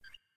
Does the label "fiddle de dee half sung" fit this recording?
No